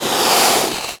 attack; spider

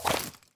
pistol holster; ins2; weapons

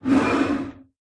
vox idle

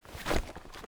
armor step; snds jack gmod

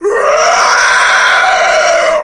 hit, bloodsucker

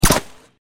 sniper rifle mod fire

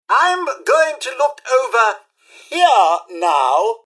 generic robot alert idle; mrhandy; npc

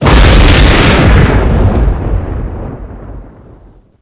dragon thunderbolt

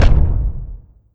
step; cyberdemon

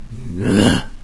zombie attack; doom3

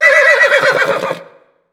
horse shout

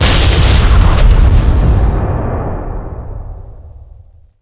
explosion nuke small 3d; weapons; fatman

